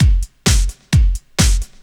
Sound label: drum 130 straight; Techno Trance Essentials